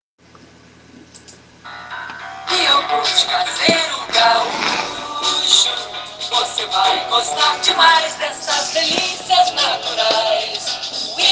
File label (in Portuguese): Jingle